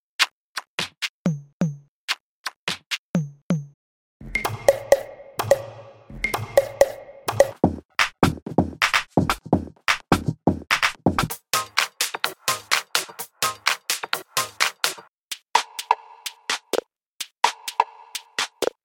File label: Percussion; Berlin